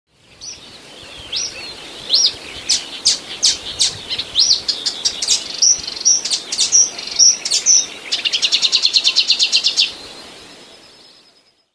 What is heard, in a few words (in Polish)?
lozowka, fragmentu piosenki łozówki, końcówka przypomina trel jakiegoś ptaka; wroblowe; zapozyczenia